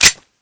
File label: sliderelease, deagle; cstrike